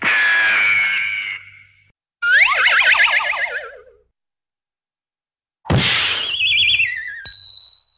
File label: ricochet crash